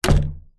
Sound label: household door cabin wood front close